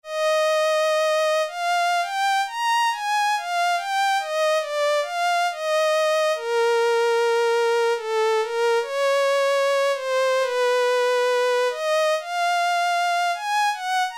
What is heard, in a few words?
Violin, Roland JX-3P